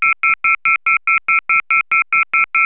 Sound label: off hook